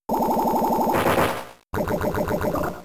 Sludge Bomb; pokemon; gen 3; attack moves

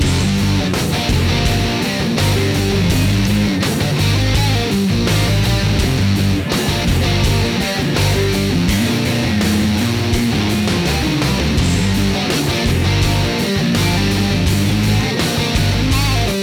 race

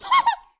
Hihi; talking tom cat 2 android ios online gamerip 2011

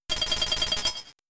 Ice Beam; Pokemon; Gen 3; attack moves; RSE

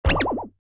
Mouse Die; Fancy Pants World 1 Remix

